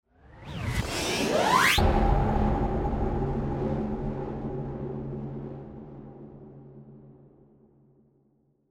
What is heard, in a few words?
Ascend Burst